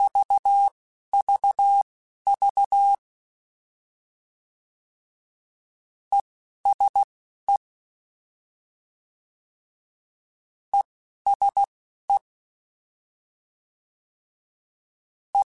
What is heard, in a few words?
CW Code Groups Suffixes, ESE ERE